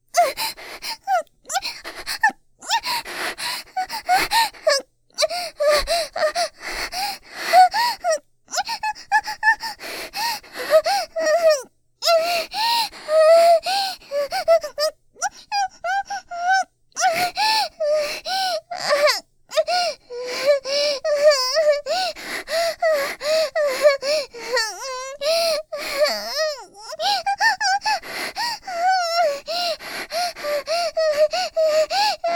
狸喘ぎ

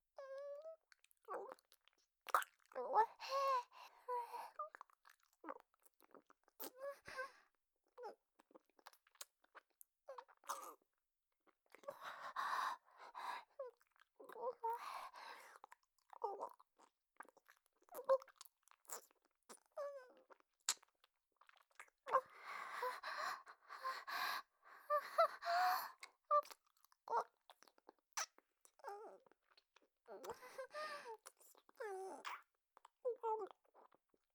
ぇろ…んぷ…, 右耳

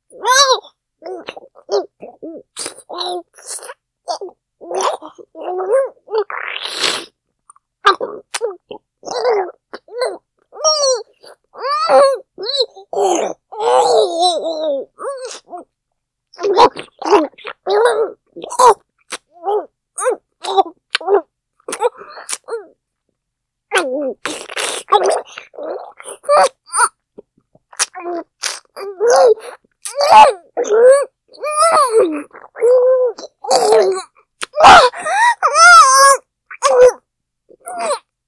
フェラ増しスパイス！ イラマチオ+精液うがい風; ジト目で爆乳JKうさぎっこ 首輪ちゃんのわけありや 初接客

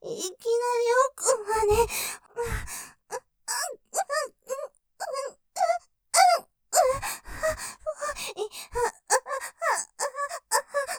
喘ぎ いきなり奥まで・切なそうに喘ぐ; ステレオ; ループで楽しむ！シェフの気まぐれ抜き抜きコース

